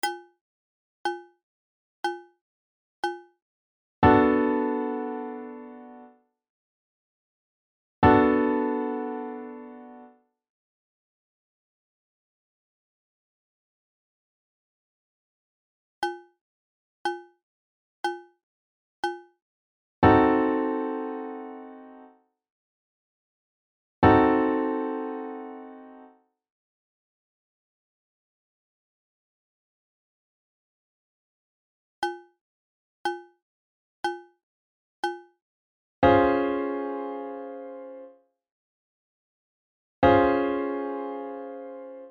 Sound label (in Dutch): vierklanken